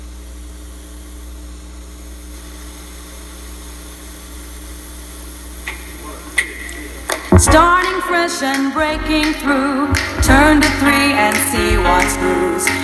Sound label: Jingle; Turn To 3